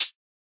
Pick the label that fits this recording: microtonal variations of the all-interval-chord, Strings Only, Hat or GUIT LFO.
Hat